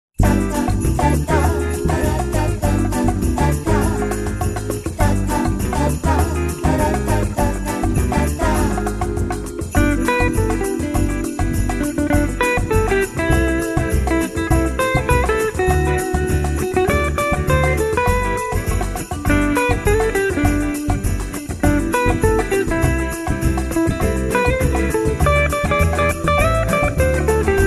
JINGLE BELLS BRAZILIAN

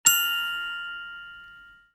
bell; noviygod

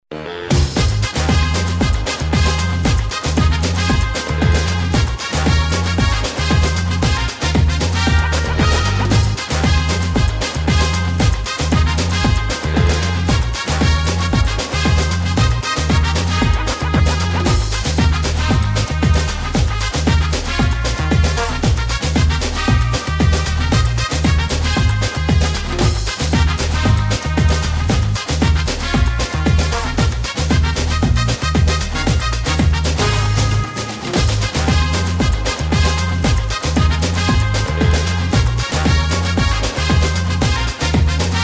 JINGLE BELLS SAMBA

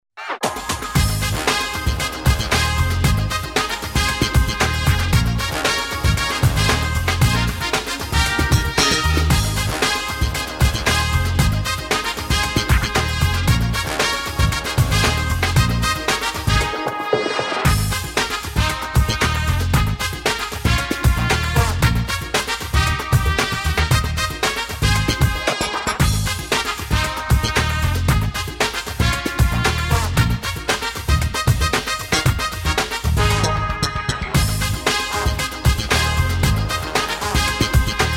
JINGLE BELLS FUNK

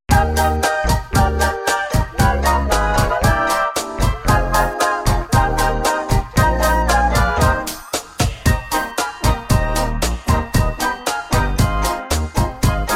JINGLE BELLS QUIRKY

